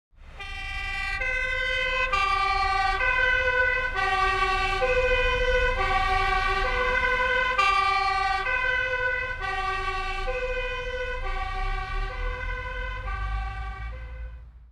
Fire truck passing by with siren

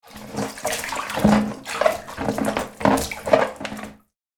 Small bath air toy soft water splashes; foley

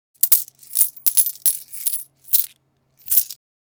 Casino coins being moved around in hand

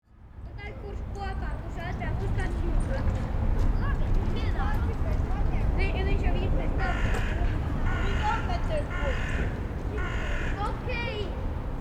Suburban playground ambience children playing

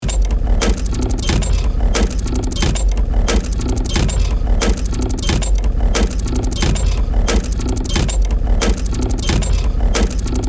Steampunk machinery automated loop

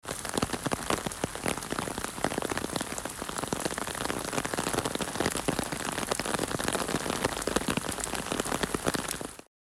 Rain on umbrella underneath perspective

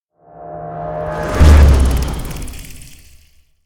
Intense powerful whoosh transition

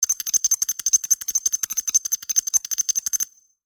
Futuristic text generation short

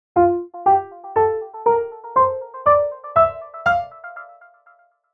Synth and piano F major scale; gfxsounds

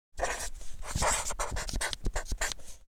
Permanent marker writing paper surface short

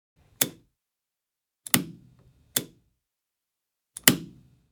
Helicopter inside interior pushing buttons

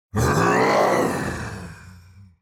Demon king lord growl grunt

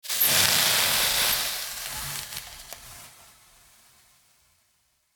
Omelette hissing pan cooking; household

